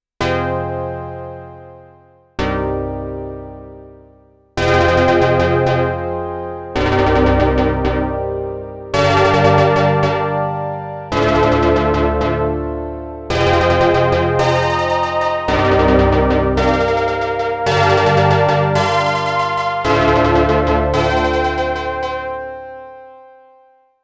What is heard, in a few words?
Fat Chords, Korg Dispersion